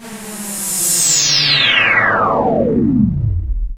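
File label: SYN-FX, synth; E-Lab; X Static Goldmine 1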